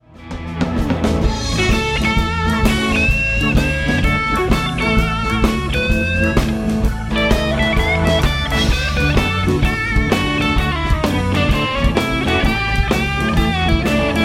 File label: Live Retro Tone Blues Solo